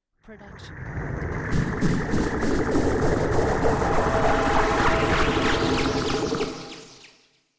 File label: magical charge up; soundscrate